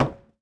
wood button; Minecraft; Votive World

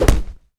knockback, player attack; Minecraft; Votive World

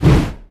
strong, player attack; Minecraft; Votive World

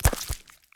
step, spider; mob; Minecraft; Conquest Default

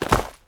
fall small, damage; Minecraft; Conquest Default

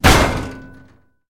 metal, zombie; mob; Minecraft; Votive World

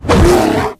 polarbear warning; mob; Minecraft; Votive World

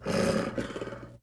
wolf growl; mob; Minecraft; Votive World

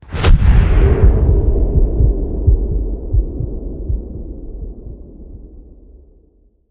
portal trigger; Minecraft; Conquest Default

